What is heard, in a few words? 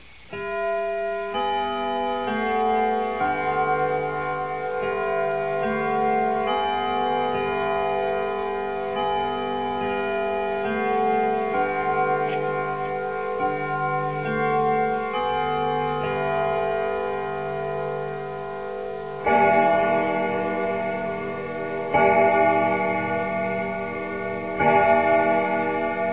clock bell